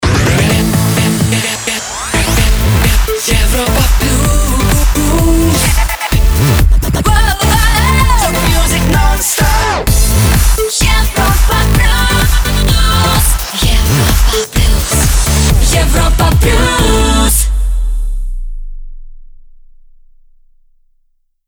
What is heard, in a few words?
Jingle Main ID; Europa Plus